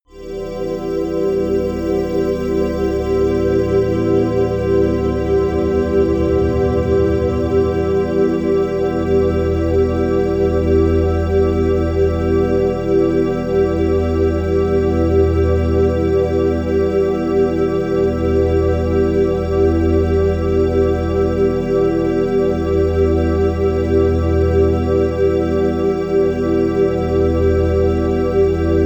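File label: angel air, pads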